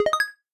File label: menu Choose; todee and todpee